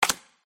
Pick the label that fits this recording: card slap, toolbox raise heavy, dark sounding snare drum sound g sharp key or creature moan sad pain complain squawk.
card slap